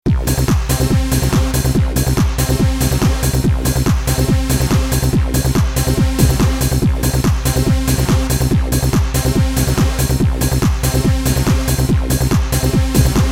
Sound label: Roland MC909 uk hard house